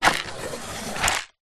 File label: household furniture wooden drawer removed small metal jewelry inside slide wood; surface; zapsplat